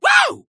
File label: woo